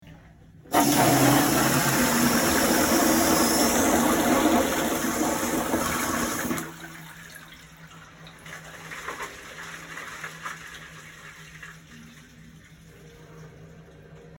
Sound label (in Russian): sliv unitaza